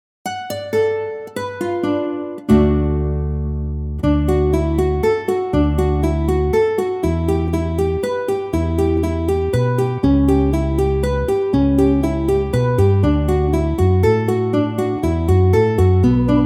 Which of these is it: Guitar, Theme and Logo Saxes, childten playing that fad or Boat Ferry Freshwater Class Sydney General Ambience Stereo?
Guitar